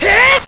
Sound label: jump; quake2; link